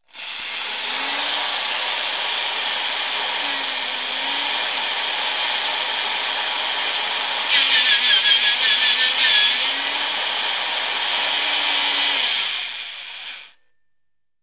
Gritty Metal Drill, air tool

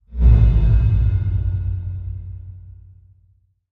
Bass Impact Dreamy

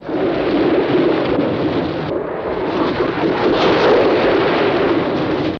Car Dirt Swerve Bump; hits & rattles

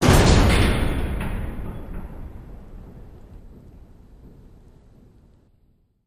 Metal Gate Slam, metal gate

